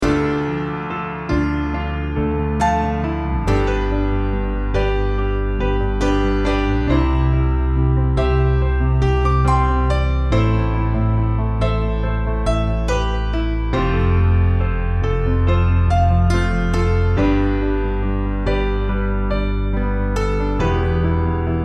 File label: Healing Melody